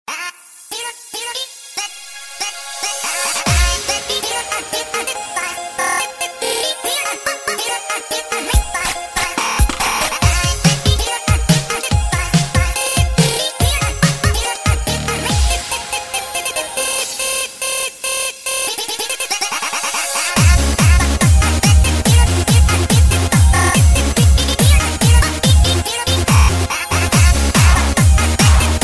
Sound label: Hard Dance